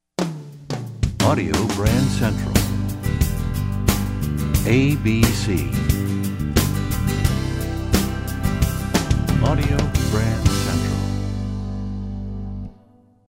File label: Desert Wind, radio jingles